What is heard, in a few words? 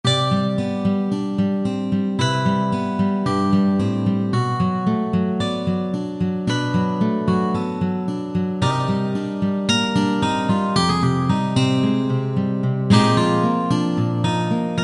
Solo guitar GM BPM112